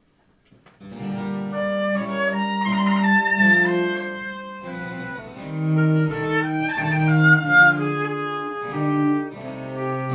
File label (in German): Sonate G-Moll; CPE Bach